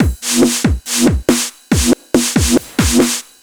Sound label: Breakbeat 140 BPM